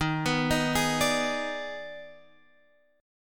D sharp Major 7th Suspended 4th Sharp 5th, D sharp, down